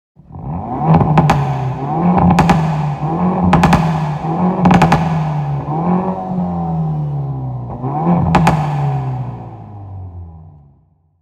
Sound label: Veloster N tunnel